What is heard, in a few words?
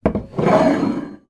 foley bottle wine down then slide across wood table